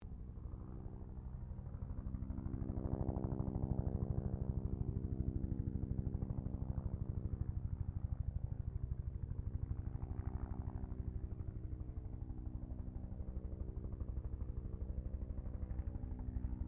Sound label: HELICOPTER 1967 BELL UH1 IROQUIOS PASS; RAN UH-1 Iroguios; Helicopters (Sound Effects:Vehicles)